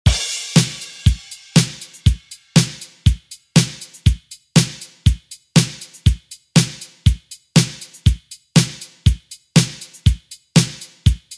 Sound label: 70s Re Drum; DJ Mhark